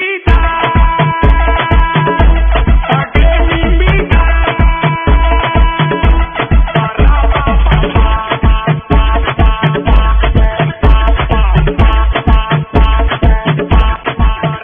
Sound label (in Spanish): Rumba